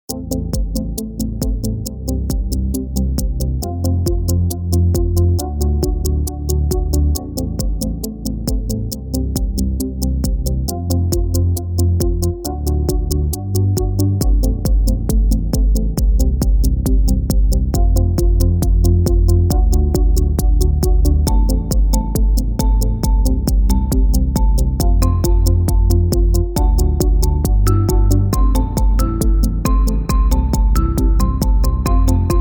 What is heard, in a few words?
Positive Tick